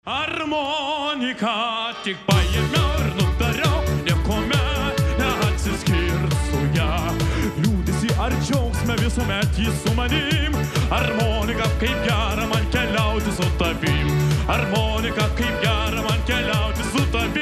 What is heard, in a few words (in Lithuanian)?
armonika